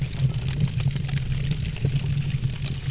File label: Small Building Fire; war3source; Orc Human